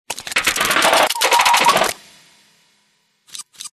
science fiction steam punk machine operate clicks glitch break down; audio mangler